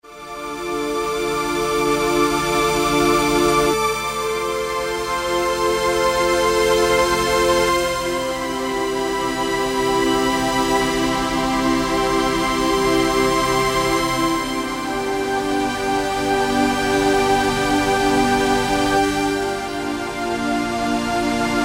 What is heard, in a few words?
Eurostrings; Roland M-DC1